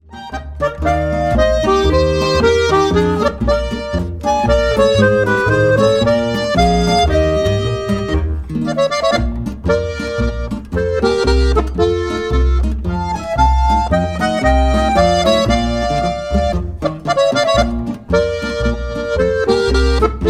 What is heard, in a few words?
Polka; Kastl